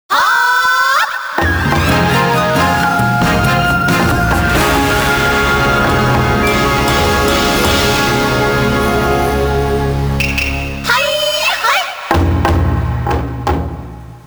Victorious Battle (WIN SCREEN); Samurai Shodown; Samurai Spirits 6; Tale of the World's Greatest Swordsman; PS2

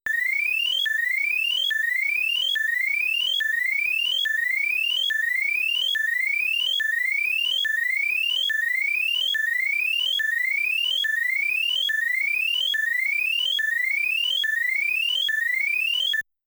Tone; Power Flying Part; Motos

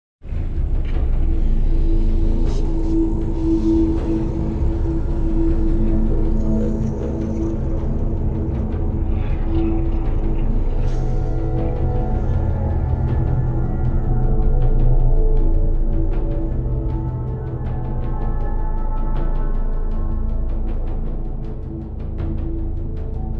orc mine explore day

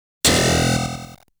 Enemy Attack Sound; Saint Dragon

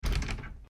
Door locked

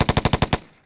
GUNFIRE

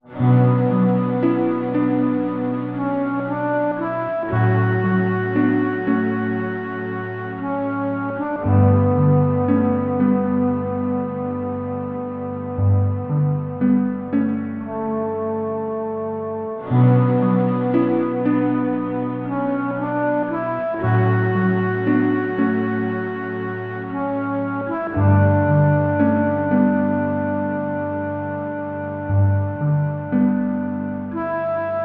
sad song; Down the World: Mervil's Ambition

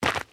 Cave Footstep; The Inn

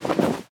Tnt Box Parachute Close; angry birds rio

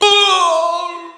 Falling

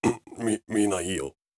in Pain

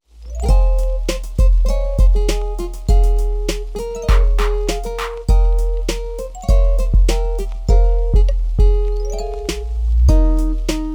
Lofi Remix; Pokemon Lo-Fi Megamix 2020; Castelia City

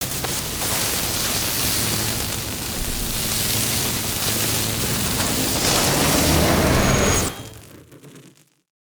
asylum altar electrify

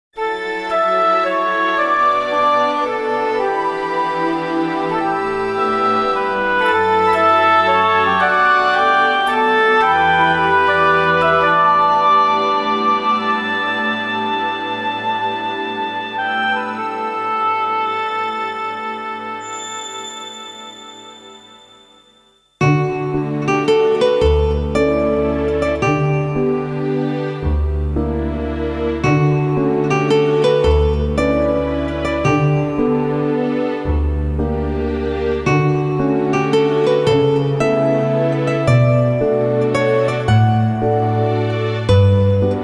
guitar ver.; Kanon; misaka shiori

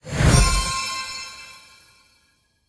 Collectible XP Levelup

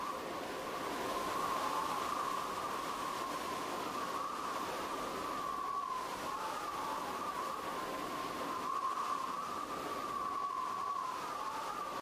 Wind Loop

